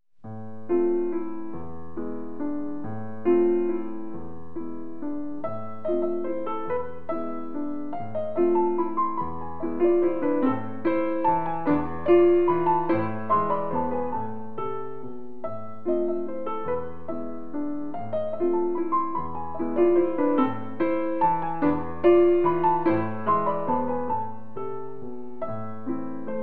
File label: Mazurka